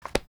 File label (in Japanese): page toji